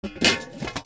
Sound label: GARAGE